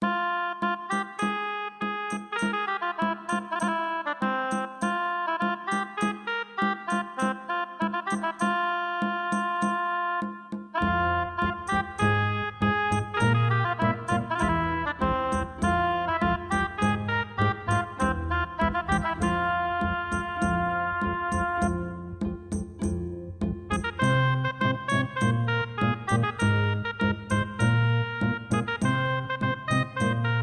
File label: Tavern; Azural Kane's UO Juke Box